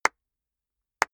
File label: clapping very slowly